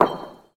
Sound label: reel bounce; dark king